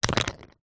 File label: cuttlebone drop ground; zapsplat